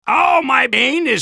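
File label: soldier pain critical death; TF2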